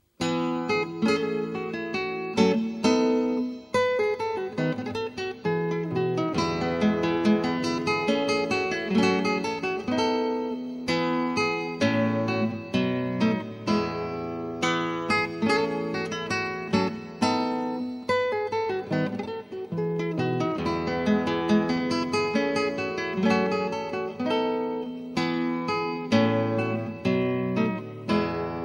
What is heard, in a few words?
gavotte en rondeau, guitarra